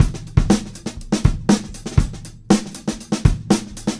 Live Beats, R&B Drums

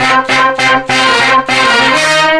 Horn Sect Effect

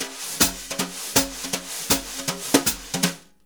BRUSH, Jazzistic; Univers Sons

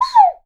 bird calls, percussion; Voice Crystal - Steve Reid Definitive Percussion

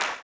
CLAP, blues & soul; ILIO; Vocal Planet

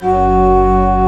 G#1, pipe organs; Greytsounds VOL-1

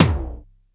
LOW TOMTOM, D110 DRUMS; Crypton - DrumBase5000

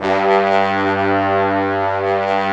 BRASS 2 -R; AKAI S6000 CD-ROM - Ultimate

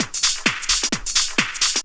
drum 130, techno trance drumloops

